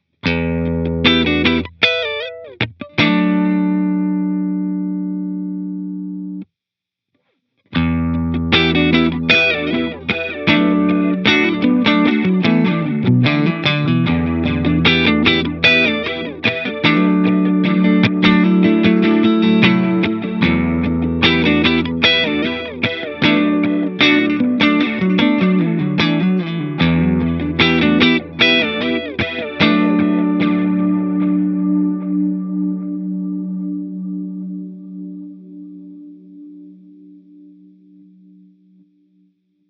Tape Old Tape, echosystem

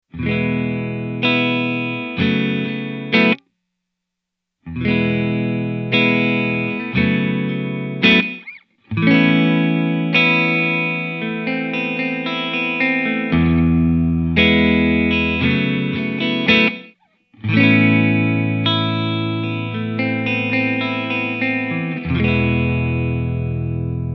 Gated Reverb Open Chords

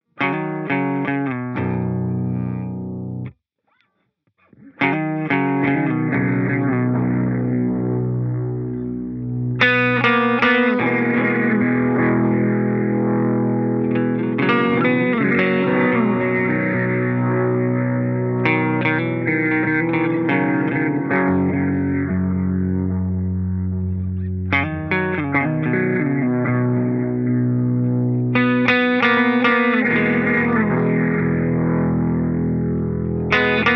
Analog BBD

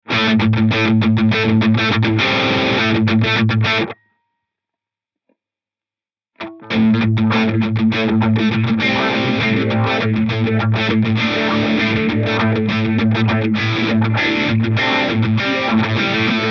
Filter Pulse

